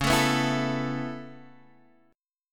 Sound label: Db Minor 7th Sharp 5th Db down Guitar Half Step Down